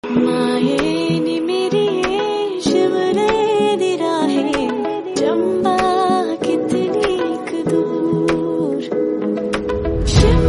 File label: Ringtone; himachali